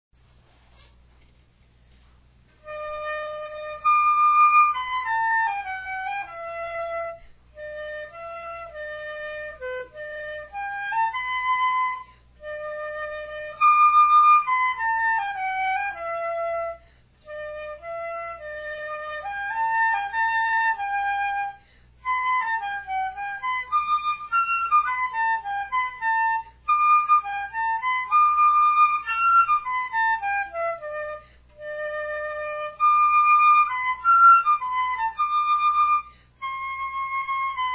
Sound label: Flute